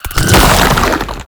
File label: Horrors Charge; Warframe; ManInTheWall; Octopede